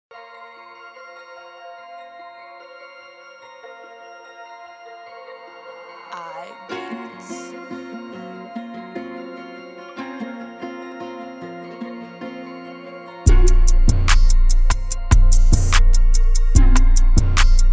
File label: Instrumental